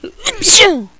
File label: sneeze